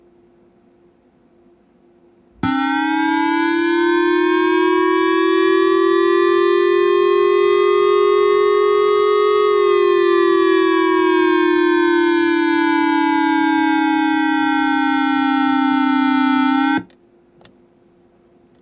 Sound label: kokuminhogo siren